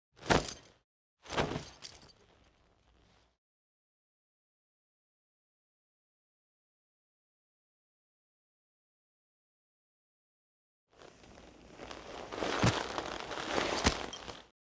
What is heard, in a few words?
Foley; Drifter; Warframe; Cinematics; Duviri Quest; Courtyard